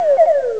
heart bounce; ST-XX Amiga Soundtracker Sample Packs

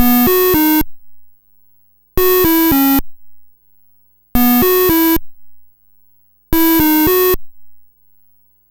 Apple II Synth, Chiptune; Sonic Foundry (Sony Creative Software)

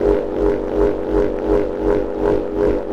Wind Wand Drone, Ethnic Percussion; Sonic Foundry (Sony Creative Software); Ambient Atmospheres & Rhythms

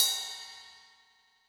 ride, Zoom MRT-3B

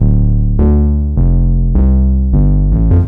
Phatbass Bass, Drum N Bass; Sonic Foundry (Sony Creative Software); Knutrix Drum & Bass

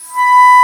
Alto Flute mf B3; Digital Sound Factory Virtuoso

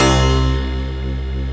SYNT C2, SYNTH; AKAI S-Series CD-ROM Sound Library VOL-2

